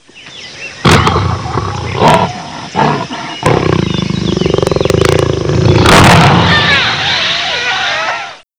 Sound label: jaguar